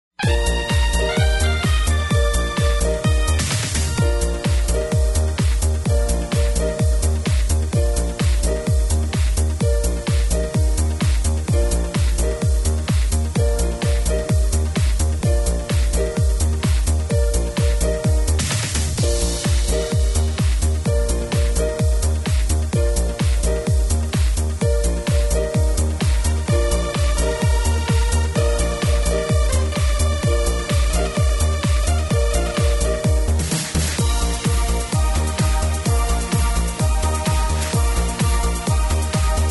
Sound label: rhythm track, square dance music